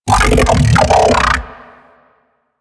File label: Mech Ambient Vocal; Nechro Mech